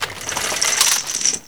Inf Weapons Foley 3rd; Red Orchestra 41-45

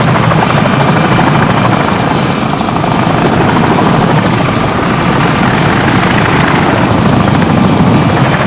hind move; veh hind move

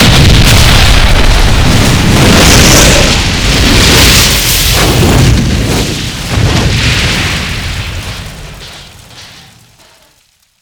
explosion tanker truck flame; Explosions; Call of Duty Modern Warfare

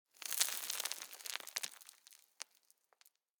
ambient; World of Tanks

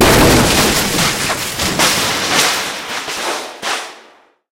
car wreck, tanks; War Thunder

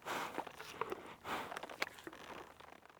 breathing loop; Rust

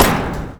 catwalk run

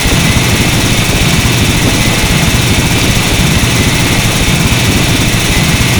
tunneler rotor dig

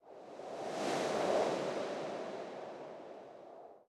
ambient port default; World Of Warships

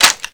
weapon; Call of Duty Modern Warfare

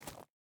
footstep burlap forest jump start; Rust

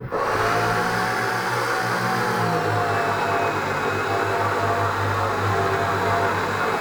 Aircrafts L-39 Seat Adjustment Down; DCS

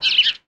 Amb Animals; Red Orchestra Ostfront 41-45

